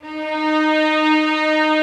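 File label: Violin Legato D#3; Digital Sound Factory Virtuoso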